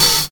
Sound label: natural open high hat a key